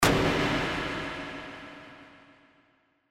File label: Burst Reverb